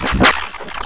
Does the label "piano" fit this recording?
No